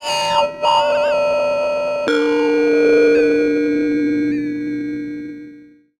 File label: Tuning Bell; Freaks